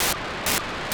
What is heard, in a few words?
435,700 4k8 GMSK Mobitex (FM), beacon; D-Star ONE iSat